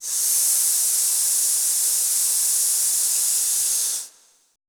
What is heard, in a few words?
VOICELESS, Choir; Best Service; Extended Classical Choir